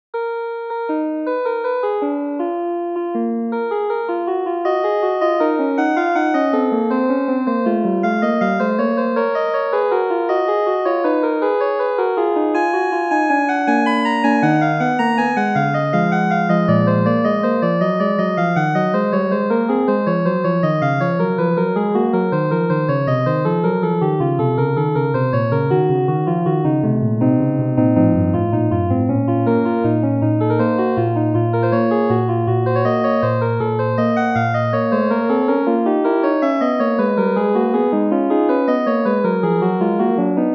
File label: prelude stretched